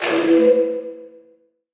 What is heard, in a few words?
Impact FX